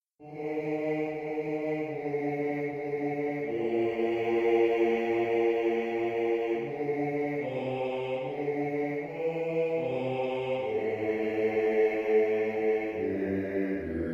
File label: Bass, barbershop